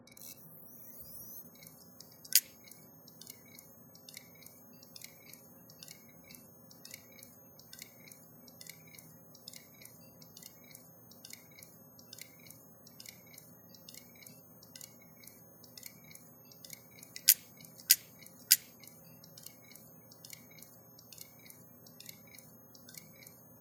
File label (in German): Hitachi Festplatte Klickt